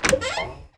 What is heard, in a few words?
door